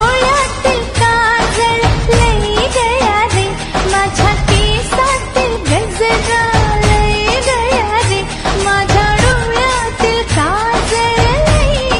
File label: Marathi Ringtone